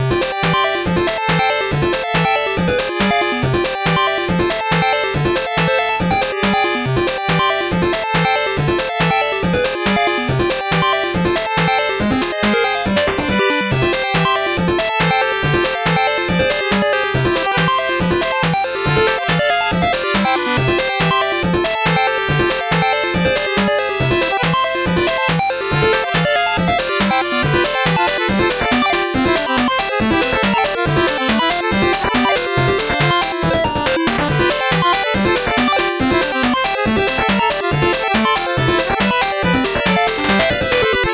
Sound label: 8bit bgm fast